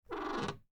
Door Creak Wood, Foley, Door, Creak, Wood; Creaky Door Sound Effects

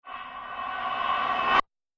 Horror Abrupt Riser; Scary Soundscape Sound Effects

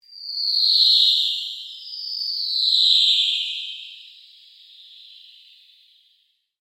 PULSE LONG DOUBLE SWEEP; Sound effects created by manipulating other sounds; Sweepers; Sound Design; Foundation4

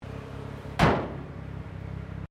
CAR MAZDA RX7 SERIES3 EXTERIOR IDLE BONNET CLOSE; 1983; Cars; Vehicles

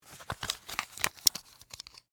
Foley, Sun, Glasses, Remove, From, Case; Dressing; BlastWave

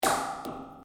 Metal cable strike; Material components to create more complex sounds; Materials

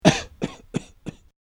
Dry Cough Old Man; Dry Cough - Old Man Sfx; Clearing Throat Sound Effects

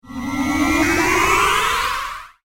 Teleport, science fiction; Take Me To Your Leader

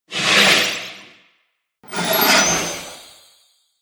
Firework Magic Twin Pack; Magic Sound Effects

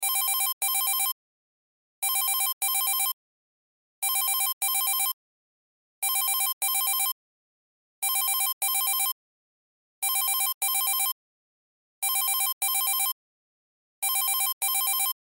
Cell Ringtone; Loop; Technology; Cell Phones; Electronics

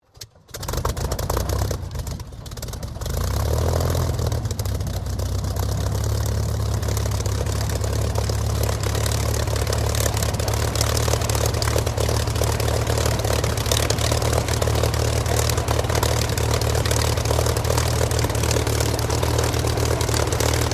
AIRCRAFT SUPERMARINE SPITFIRE MK-XVI STARTUP IDLE; Airplanes; Vehicles; Airplane Sound Effects

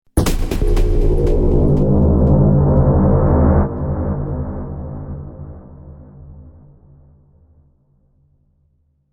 Opening and closing of airlock door.; Doors

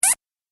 Arcade Game Single Squeeze Squeak; Retro Inspired Sound Effects - Think Nintendo, Atari, C64, Etc.; Andrew Fleming

